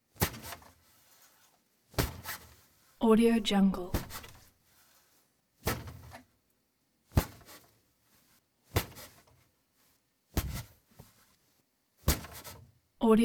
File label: bed sheet bump bunched up hit against wood; prosoundfx